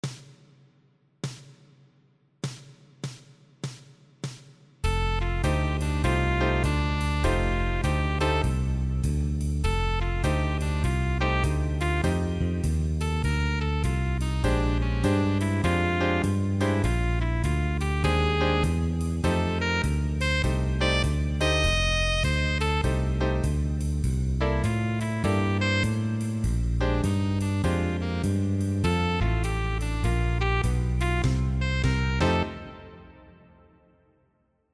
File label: play along guitar